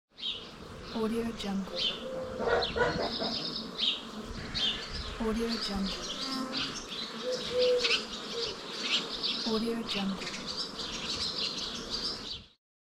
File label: village sounds